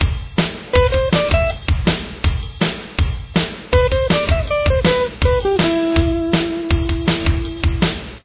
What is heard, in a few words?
lead, guitar